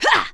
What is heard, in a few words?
Jump; Player; Huntress; Dungeon Defender; DunDef